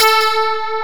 SYN, SYNTHESIZER; DIGI WAVE #1

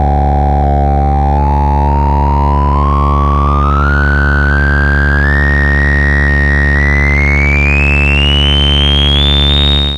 EMS MOD RESO, EMS synth; TECHNO-BWARE; EMS SYNTH-A

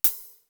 WET HAT; CYMB.HH; East West; Global Sounds

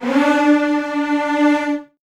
D3, Virtuoso Strings; ILIO; Kirk Hunter Virtuoso Strings VOL-2; 90 s Sample CDs

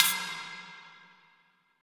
perc, Drum & Bass, 170 BPM

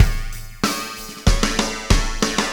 Robotic Beat; Processed Drumkits

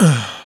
Puppy Talk; Spectrasonics - Supreme Beats - World Dance; Puppy Ratchet

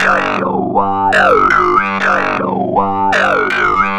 Throatline, Industrial; Nasty

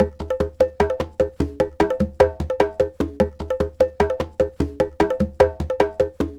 Conga Groove, Latin Percussion

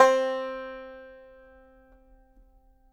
banjo C4, banjo notes; Sampleheads - Whole Lotta Country 1 & 2

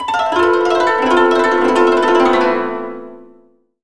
koto gliss; AKAI S-Series CD-ROM Sound Library VOL-7

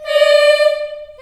D4, Choir, CHILD; CHILD IHS

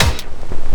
LOOP L, Drum & Bass 160 BPM

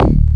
KNOCK BASS

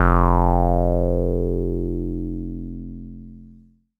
bass F1, ARP Odyssey; Polyend; Simulacrum Sounds; 1 Shots; Synths

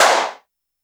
snare, Roland R-70

